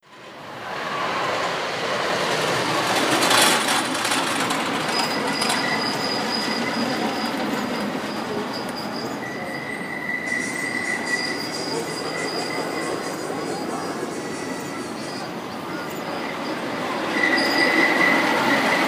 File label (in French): manege ronde